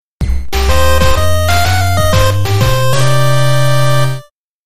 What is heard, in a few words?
Detective Left Jingle